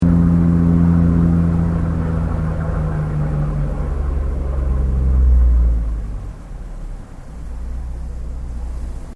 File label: BOAT TOURIST FERRY STOPPING